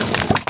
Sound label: meat head shoot